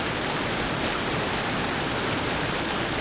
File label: fountain; Pirates Of The Caribbean